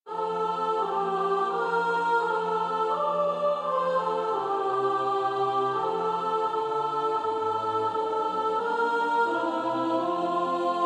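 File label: soprano, practice music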